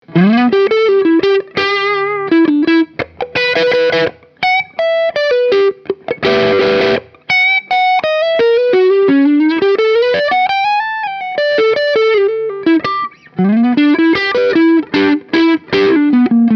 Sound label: Fender Squier Bullet Strat HSS neck distorted blues rock fusion